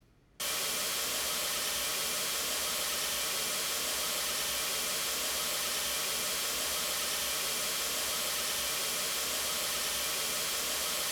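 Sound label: MLS16A raw recorded; RCF course 2021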